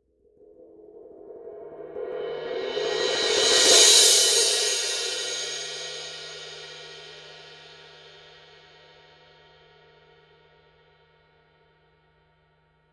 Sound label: crash roll